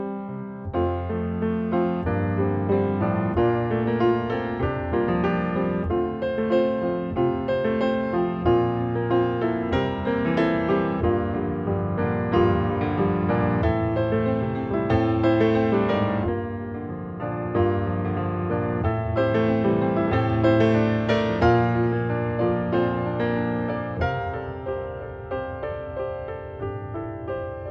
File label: piano